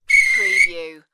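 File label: Referee Whistle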